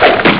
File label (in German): drehen; Amiga Soundtracker sample